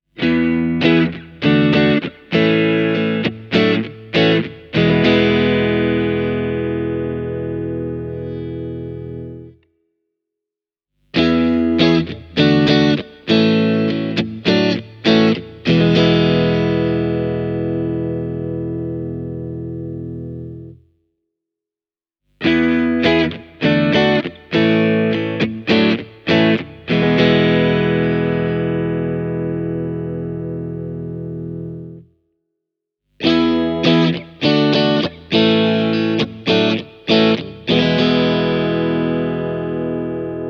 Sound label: tokai classic series st